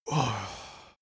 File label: cartoon male relief exercise